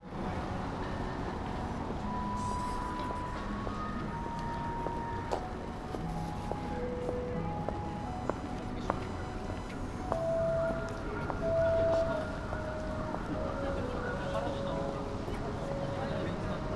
Fukushima Soundscape: Machi-naka Park, candle night; まちなか広場：2025年 3月11日; 震災から14年の夕方，まちなか広場ではキャンドルナイトが開催された．; 終わり際の時間に行ったせいか，会場は閑散としていた．; Koji Nagahata